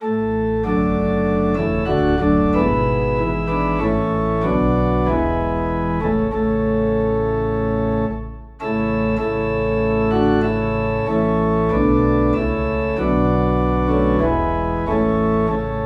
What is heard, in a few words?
Organ Low in A; We Gather Together